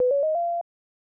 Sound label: Item Chime; Cream Pies